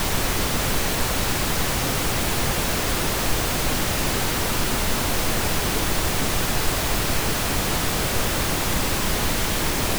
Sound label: Pink Noise; android-15.0.0 r3; google barbet; tas2562